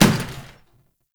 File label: Janitor Cart Impact Short; Warframe; Ambience; NineteenNinetyNine